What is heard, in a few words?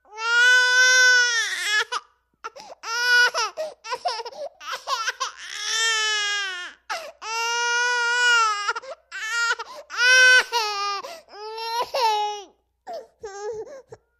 Baby: 10-month-old, Crying. Interior.; Mono